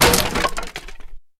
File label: Wooden Fence Break